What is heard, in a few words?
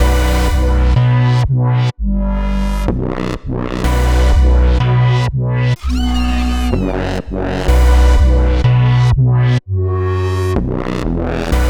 Focus Bm Full Mix, Complex Electro Synths; Prime Loops